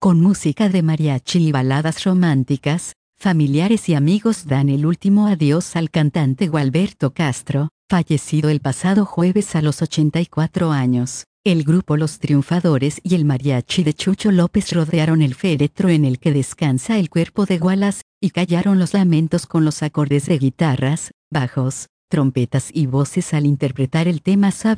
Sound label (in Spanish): Con música de mariachi y baladas románticas